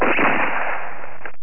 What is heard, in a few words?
Shoot